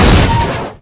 vehicle impact heavy; Source Engine; video game; vehicles

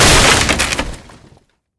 standee break; taunt; player; yeti; video games; source engine; tf

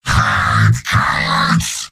heavy battle cry; mvm

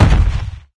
tank walk, footsteps

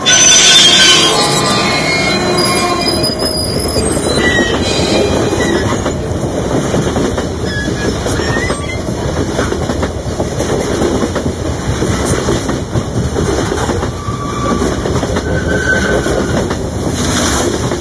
train freight loop

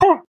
pain sharp; pyro; source engine; tf

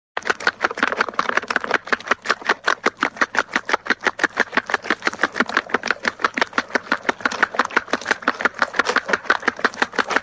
machine, pedaling wood; cartoon

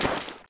taunt yeti standee equipment jingle; video games; source engine; tf

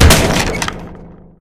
bumper car hit; tf

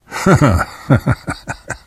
laughter

